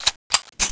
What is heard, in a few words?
m4a1 silencer on